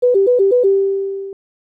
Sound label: Unused Jingle; controller test cartridge 1990 snes